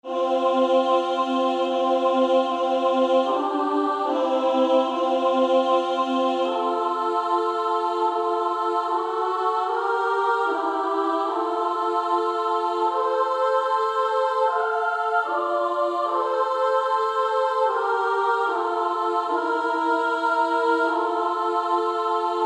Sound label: Female Choir